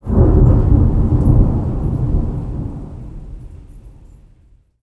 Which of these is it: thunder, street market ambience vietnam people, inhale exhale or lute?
thunder